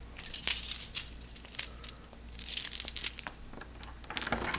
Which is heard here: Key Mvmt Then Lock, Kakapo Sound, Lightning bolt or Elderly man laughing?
Key Mvmt Then Lock